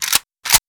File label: rifle deploy